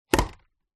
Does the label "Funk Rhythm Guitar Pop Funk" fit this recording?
No